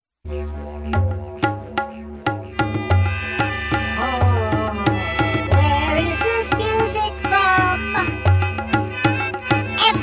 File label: Ethnic Blur